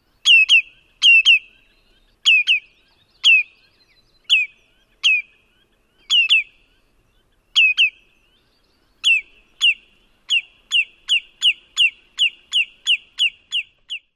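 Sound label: too-too and single-note calls, bird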